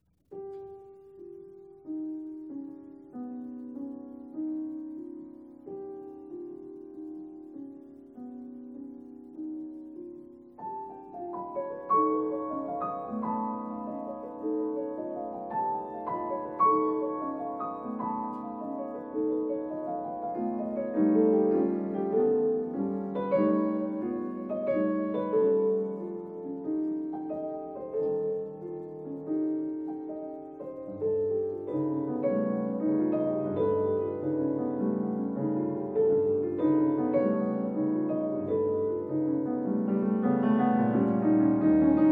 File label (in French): cloches; debussi